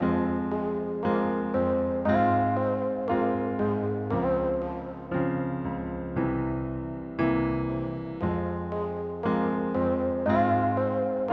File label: Melody